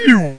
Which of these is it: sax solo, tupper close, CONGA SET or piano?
tupper close